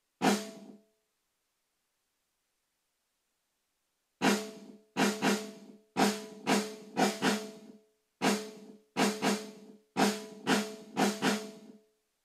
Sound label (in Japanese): アーリーのみ, リバーブ